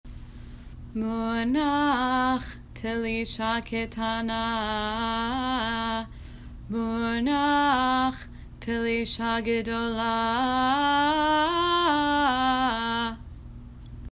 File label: munach telishah k tanah munach telisha gedola; 7. Munah Telisha-Ketanah Munah Telisha-Gedolah; Cantillation for Torah; The Jewish Theological Seminary